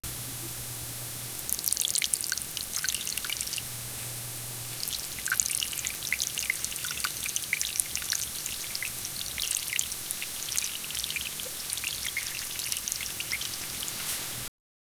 sound of cleaning, water splashing

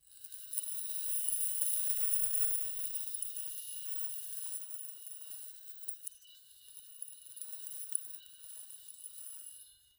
futuristic

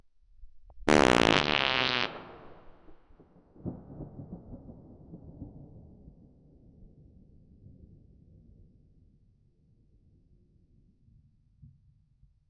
long and bassy fart

